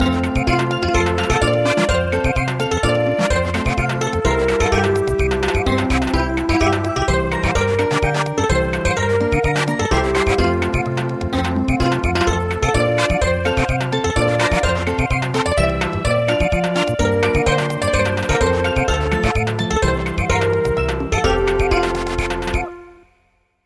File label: Samba